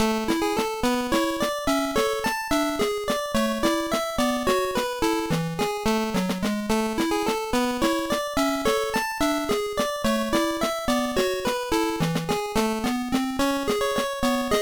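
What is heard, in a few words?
background music; No No No Puzzle Chailien; GBA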